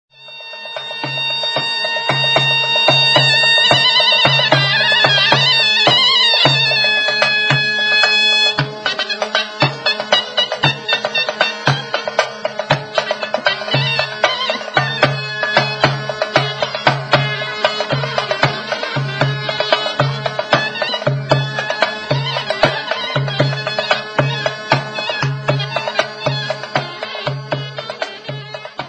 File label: Mizmar